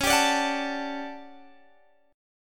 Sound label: A 13th Db, guitar chord